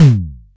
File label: low mid tom drum one shot G sharp key